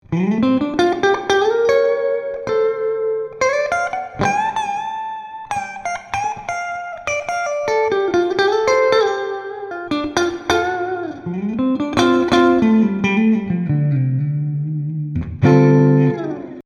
Fender Squier Bullet Strat HSS neck mid clean blues lick